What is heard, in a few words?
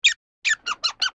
bird